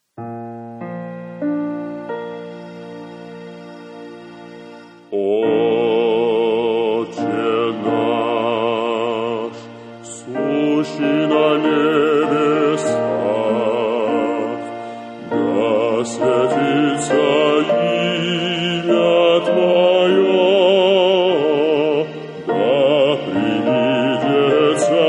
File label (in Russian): Otche nash bas; epiphany 2018